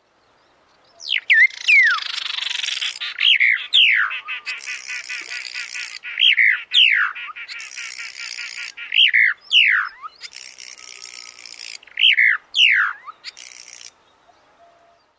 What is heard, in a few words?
black crowned tchagra